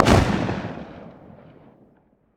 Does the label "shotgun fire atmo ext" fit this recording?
Yes